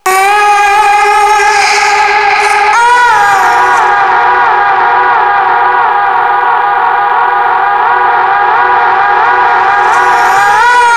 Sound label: Ohh Wo Hoo, vocals; AMG - Prototype Drum & Bass